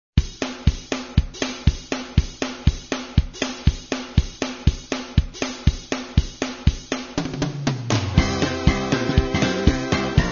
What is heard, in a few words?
 (Instrumental)